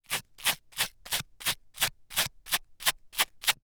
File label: Human Beard Scratching Beard With Card